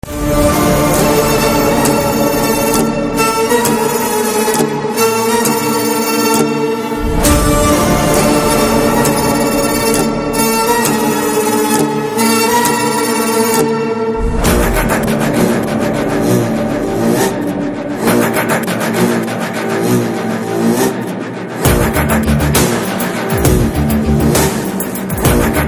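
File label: background music Telugu Ringtone; Krack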